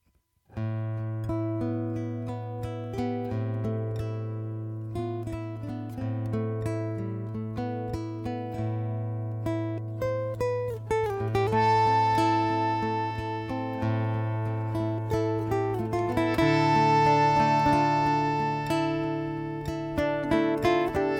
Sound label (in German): Bando ein ton musik, bandoneon